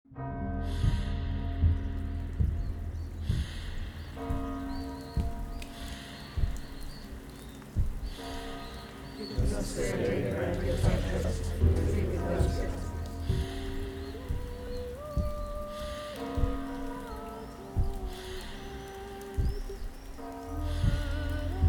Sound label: Soundscape; Stages Of Grief; faculty of film art creative technologies elective module 2024